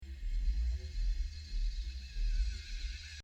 Bass burble

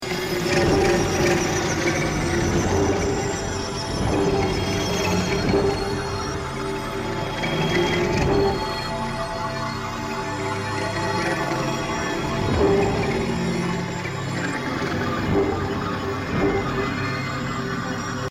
descending backmasking

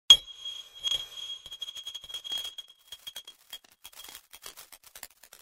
screwant morph

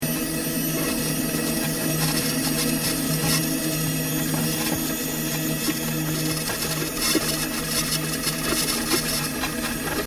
Metal stutter

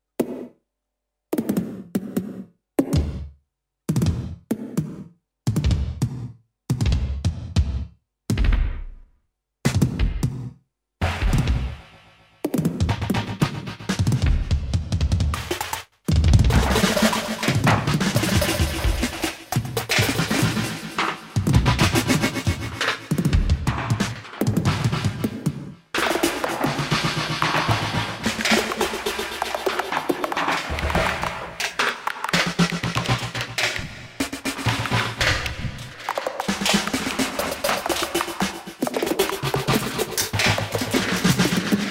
percusso

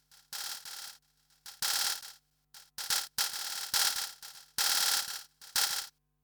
insect buzz

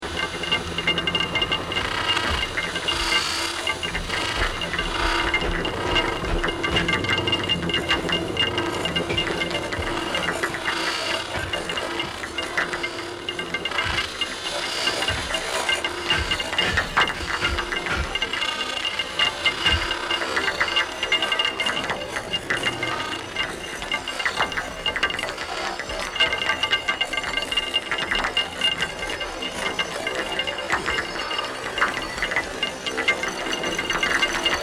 Glass rattle

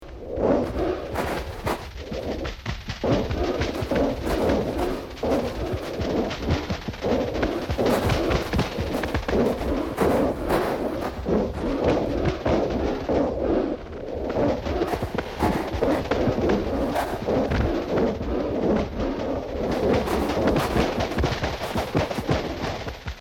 snare splashes

